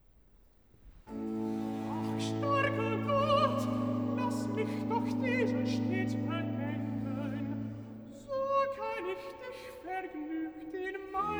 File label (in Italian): Cantata, Recitativo (Alt); BWV 35 'Geist und Seele wird verwirret'; Bach; Köthen; 12th Sun After Trinity